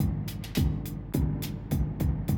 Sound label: Classic DrumLoops, 110; Best Service; XXL 1500 Classic DrumLoops; Sample CDs